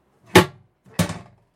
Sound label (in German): postkasten